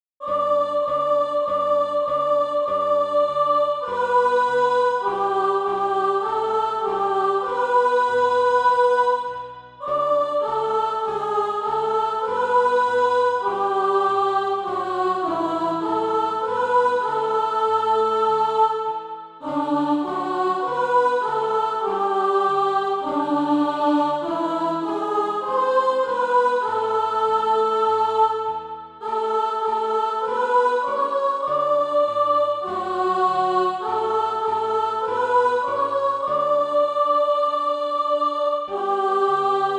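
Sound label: alto, Sheet music (SATB) including Practise Music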